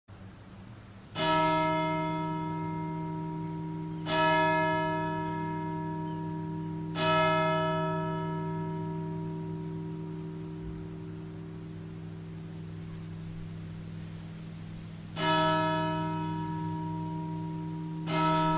Angelus church bells at noon, Catholic church; st peter catholic church stevens point wisconsin

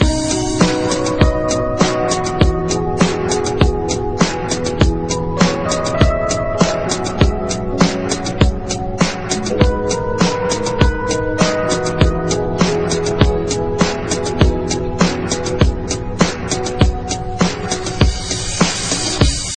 Mix Soft Soul

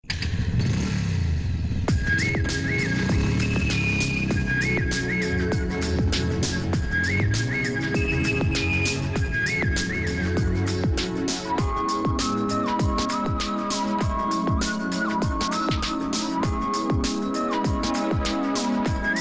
gun shot sms

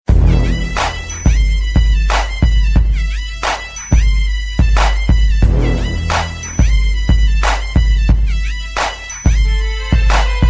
hip hop msg

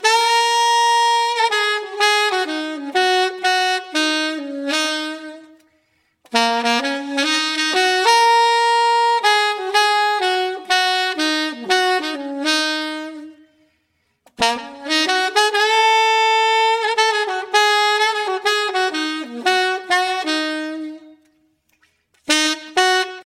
Sound of a Sax

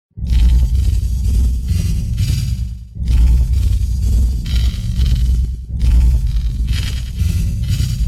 wake up alarm; ringtones

